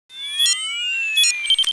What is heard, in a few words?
mine charge, weapons; XDM